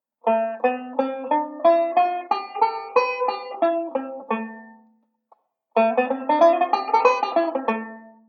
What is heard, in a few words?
Modal Sequence, banjo; Beyond Chord Melody Volume II